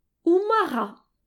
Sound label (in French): grenouille